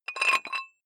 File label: dumbbell thread take off weight quick, fitnessstudio